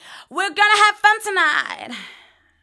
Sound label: VOC, Disco Fever VOCALS